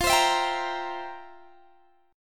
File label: F Major 7th Suspended 2nd Suspended 4th, F, down, Guitar, Open D